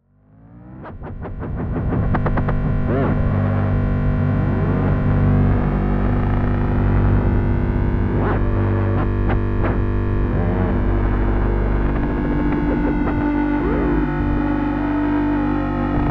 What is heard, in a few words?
Behringer Neutron Synthesizer SH Delay Drone